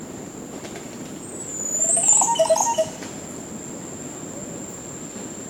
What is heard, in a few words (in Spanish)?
Yapú, Psarocolius decumanus, Crested Oropendola; Icteridae; cantos